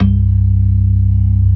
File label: CLASSIC C3, Synth and Organ